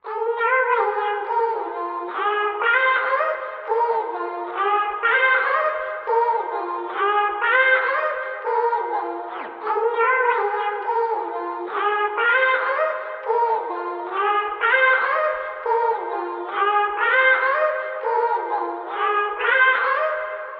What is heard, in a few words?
Vocal Loop 100 BPM G# Min; Cranberry; Cymatics; Mystery Pack Vol 4; Vocal Loops